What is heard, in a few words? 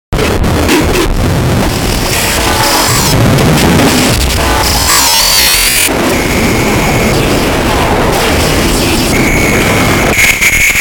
Bed Digital Glitch Warp; Production Element Imaging Element Accent Transition; 5.1 STEREO MIX; Blastwave